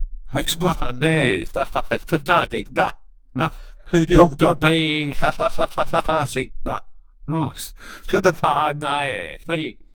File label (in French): plaisir soutenu d'un homme